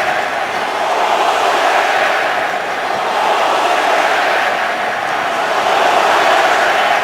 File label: crowd outside; crowd bullshit outside (Use at mane 6 scene)